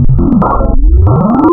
MICRO Q, wavetables, Classic Synths

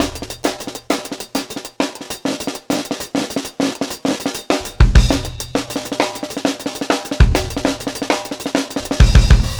Bon Bon Kit 4 Bar, Drum n Bass Beats; KJ Sawka; Drum n Bass & Beyond

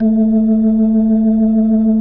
A3F, B3, Vintage Keyboards

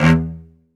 SOLO CE, Strings; ILIO

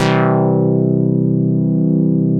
BOLERO DR, Synth and Organ; InVision Interactive; Keith Emerson

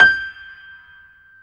PIA PRO, Roland

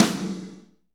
SNR, Pwr Ballad; Northstar - Drumscapes Roland

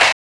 GRUNGE NOIZ; TEK PERC1; Drums In Your FACE!

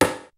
kicks snare, kid; Kid Nepro; Elite; KICKS SNARES

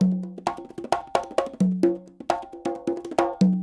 SHUF, Percussionworks

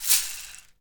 ABACUS LO; ILIO; Synclavier Percussion 2

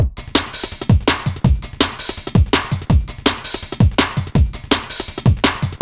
BEAT, Reason Synths; Synths and FX; neuro; Sinistarr

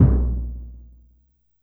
Surdo Open, Yamaha PSR-E323 Standard Kit

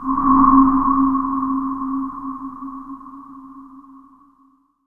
noise fx; Masterbits; Switch FX

